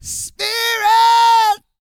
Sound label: GOSPEL